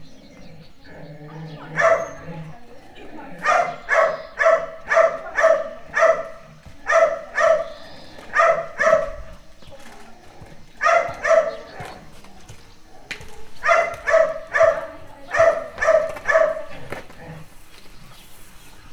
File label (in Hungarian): acsarkodik, kutya; Egerszög; termeszetben